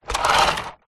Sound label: leisure rc toy car large plastic push roll carpet